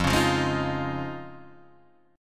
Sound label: D sharp 7th Suspended 2nd Suspended 4th F, Guitar